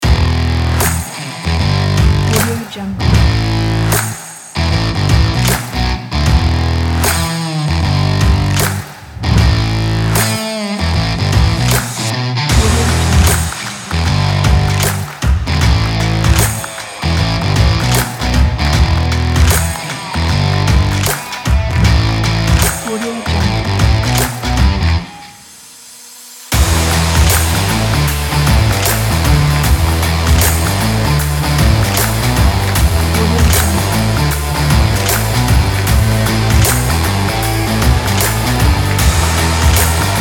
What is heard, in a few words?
sport rock trailer